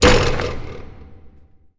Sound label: Basketball Dunk